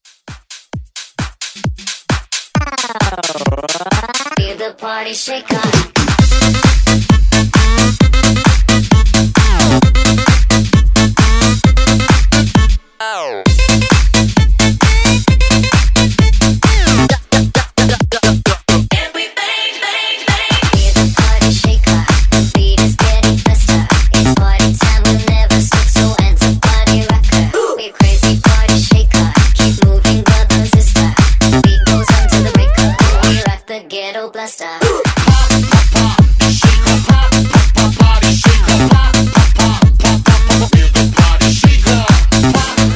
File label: Dance Redrum